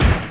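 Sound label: broken; CD Action 47a 2000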